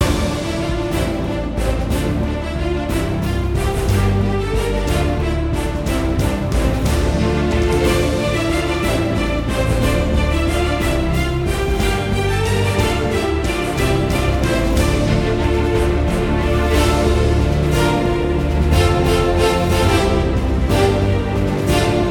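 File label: background music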